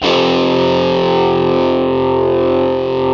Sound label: GTR, Metal Chds; Club-50 - Foundations Roland; Power Chds